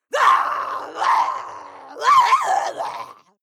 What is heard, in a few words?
female lunatic scream triple; schreie; menschen